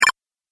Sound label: Game Power Button, video game